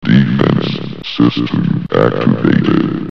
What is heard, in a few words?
flamebot alert